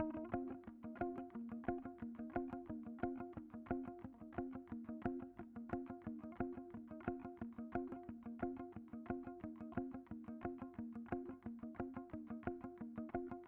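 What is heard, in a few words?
compound, electric bass; This texture consists of two similar parts performed with a wooden plectrum; Compound 2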